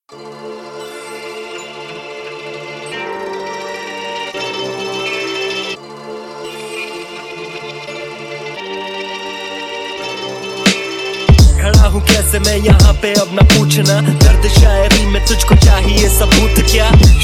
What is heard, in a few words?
Hip Hop; Gully Boy